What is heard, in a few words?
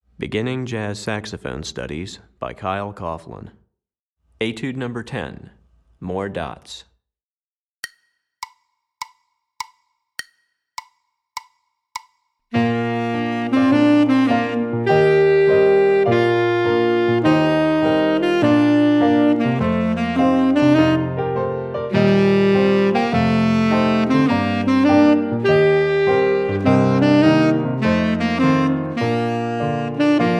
Tenor Etude, Saxophone; Etude #10; Recordings For Beginning Jazz Saxophone Studies for Tenor, Volume 1; Dotted Quarter Notes; Kyle Coughlin